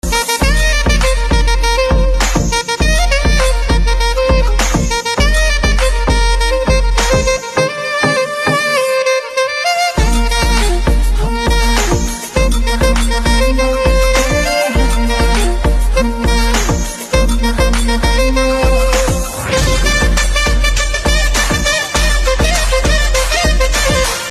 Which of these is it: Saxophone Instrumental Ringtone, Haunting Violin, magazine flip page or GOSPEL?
Saxophone Instrumental Ringtone